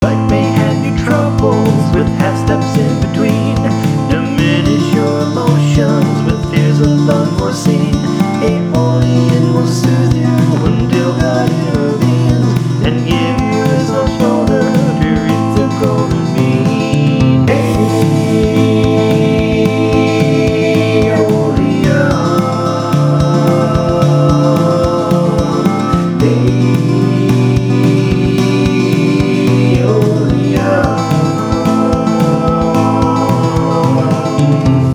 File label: Music Theory Aeolian